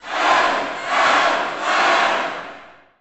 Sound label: Boxing Crowd Chant; Crowds